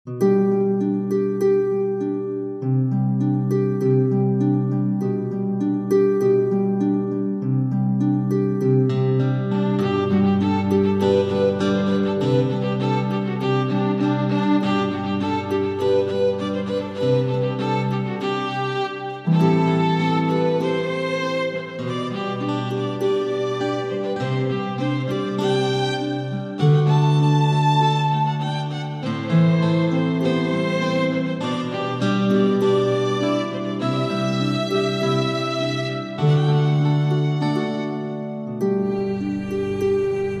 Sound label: Medieval Dream